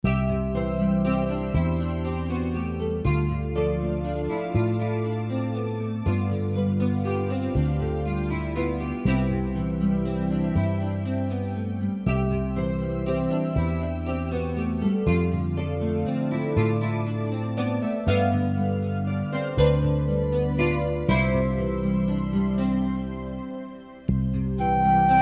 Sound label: Musicbox